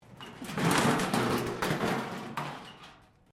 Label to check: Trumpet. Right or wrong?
Wrong